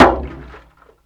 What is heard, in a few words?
BONK, CARTOON